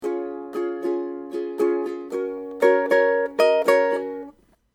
Lick, ukulele

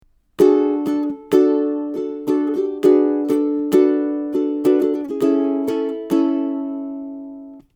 Chords, ukulele

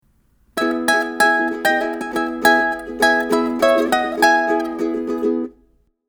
Jingle Lick, ukulele

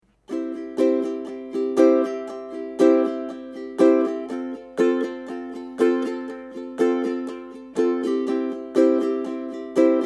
Strum, ukulele

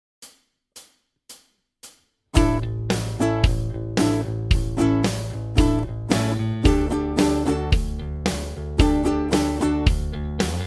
Groove Workshop, ukulele

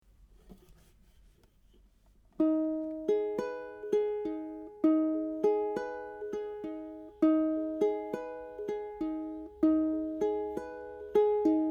Picking, ukulele

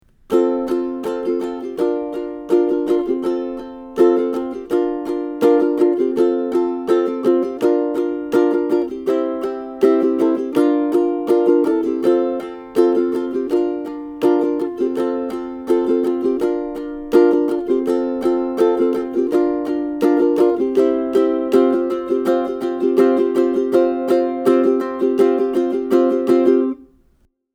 Chorus Strum, ukulele

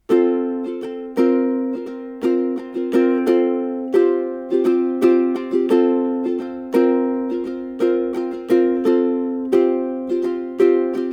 Strum, ukulele

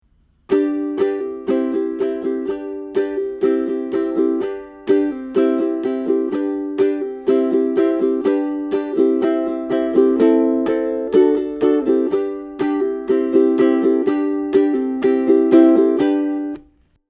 Strum, ukulele

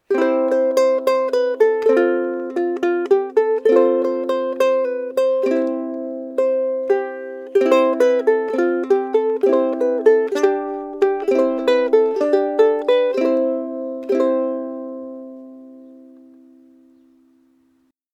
c major improv, ukulele

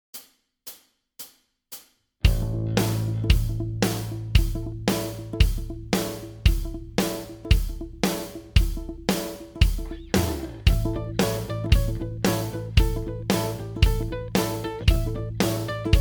Groove; Malcolm Young Blog Groove Workshop

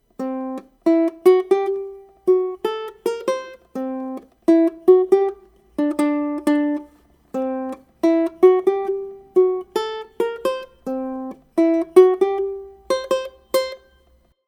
Scale Example, ukulele

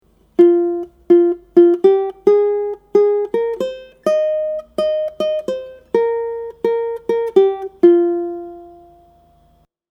PUK Riff, ukulele

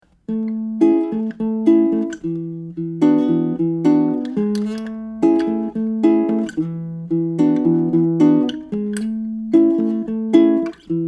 Backing, ukulele

